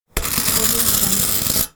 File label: wire brush scrape